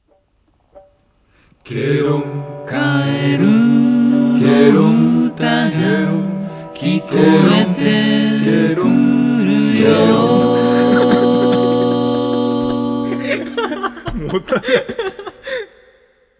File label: Vocal Only